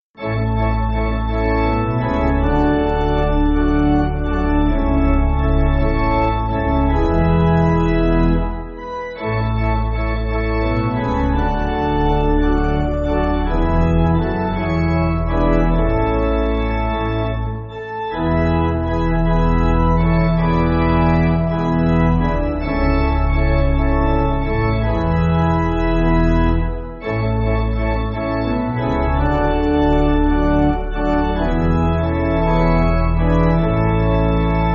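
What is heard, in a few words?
Pipe Organ, Music Backing; Dont Forget Your Father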